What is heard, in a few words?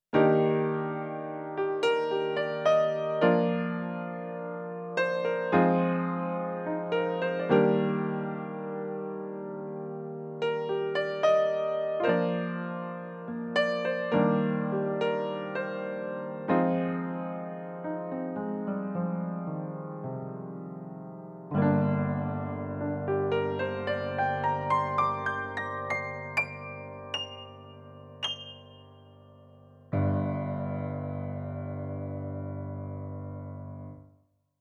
Piano